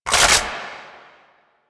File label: AK47 Reload Mag Out; Warframe; Tenno; Weapons